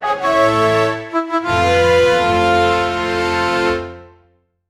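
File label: cheerful and energetic, victory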